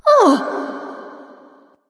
surprised light; smite; voicepack; hel

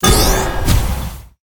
activate; smite; daji

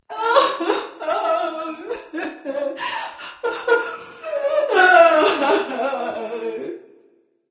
boohoo; smite; nox

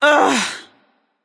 "ugh"; smite; yemoja

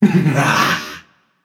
grunt attack, voicepack; smite; jorm

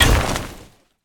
shield break metal; Smite; Cabrakan

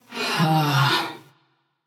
sigh passion; chaac

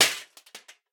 armor hit; minion battlefoley; smite; npc; roman archer

